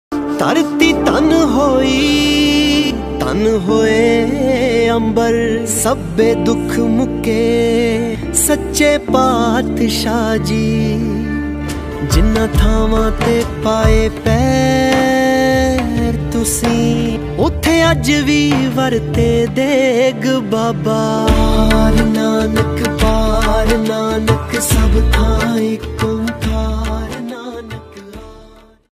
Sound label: Ringtone